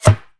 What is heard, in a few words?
Bullet Impact Wood Light; jasem just another shooter with electronic music windows 2017